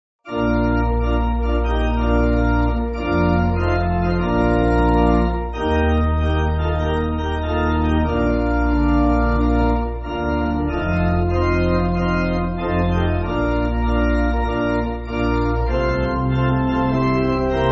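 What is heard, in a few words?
Pipe Organ, church music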